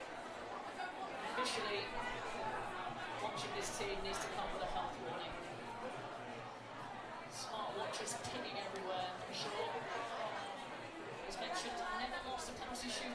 England win the Euros on penalties at MOTH Club London; Field Recordings